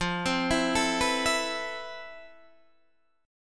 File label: F Major Sharp 11th, Guitar, Standard; FM#11 arpeggiated